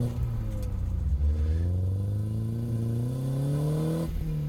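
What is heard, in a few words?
engine high hit gps; Blind Drive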